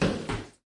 stepping

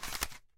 Grab Flashlight

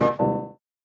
Warning HUD

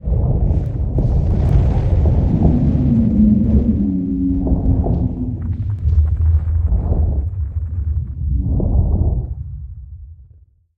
Wall Rumble Voice

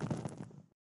Light Candle Blow; Lethal Company

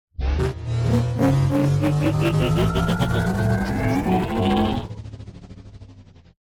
Ship Teleporter Spin Inverse

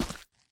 Gravel Wet

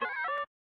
Code Broadcast Sound

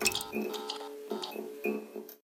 light flicker

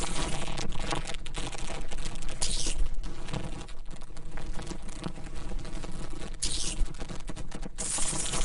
cling to player local

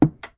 Cut Speaker

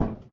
Aluminum

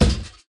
Extension Ladder Hit Wall

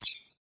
Stun Doublewing

